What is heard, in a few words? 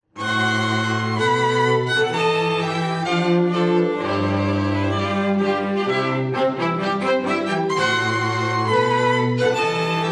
Wedding March